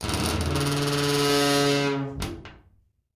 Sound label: Vault Outer Door Open Slow; safes & vaults